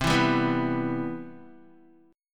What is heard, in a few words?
C Major 7th, Guitar; CM7 strummed; JGuitar